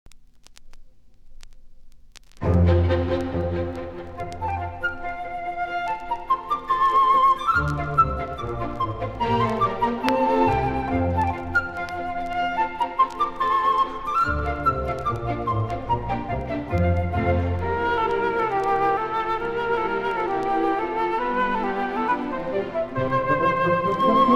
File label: Tambourin; Классическая музыка